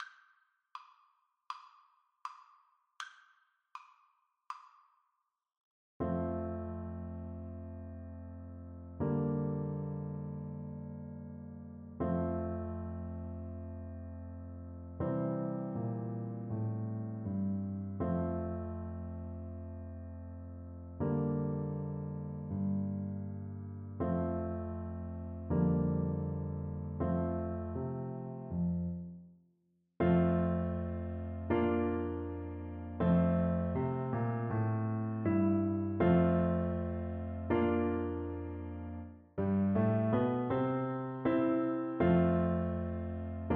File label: piano duet